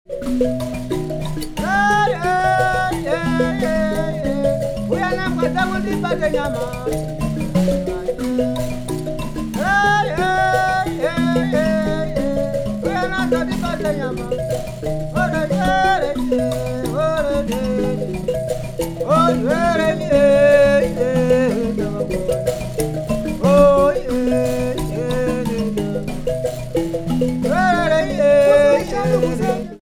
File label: mbira